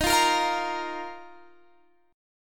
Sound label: Dsharp Suspended 2nd Guitar Standard; strummed; capo 3; x,18,15,15,18,18